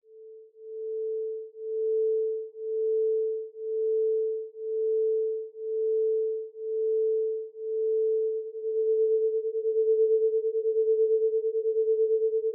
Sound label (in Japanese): 催眠律動音響 バイノーラルビート サンプル; ぴぐみょんスタジオ作品紹介